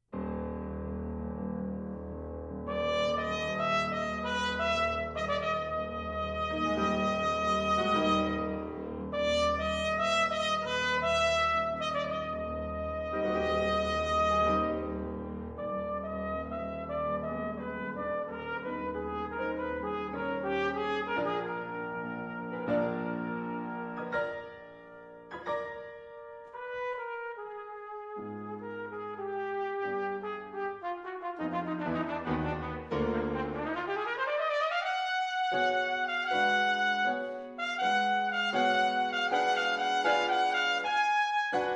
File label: Trumpet Concerto, Classical Trumpet; Arutiunian Trumpet Concerto